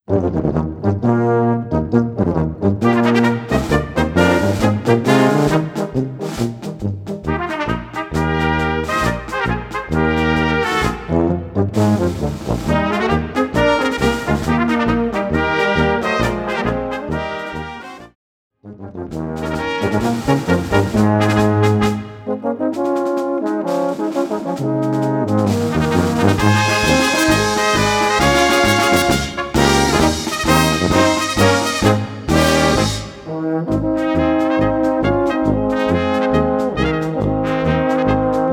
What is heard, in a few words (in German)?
Marsch